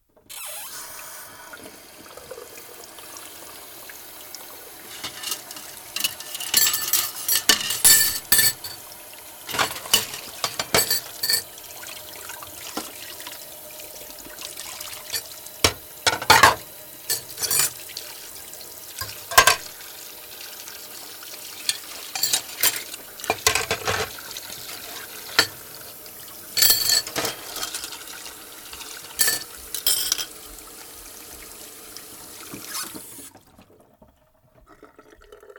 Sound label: Washing Dishes In Kitchen Sink